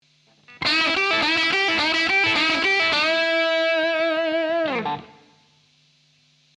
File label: lick, blues guitar